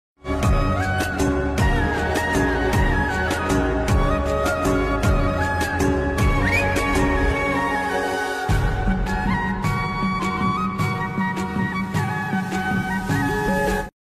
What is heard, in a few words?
Gorkhe khukuri ringtone